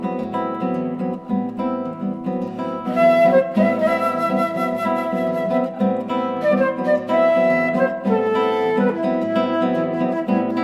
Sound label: classical guitar and flute cocktail hour; Wedding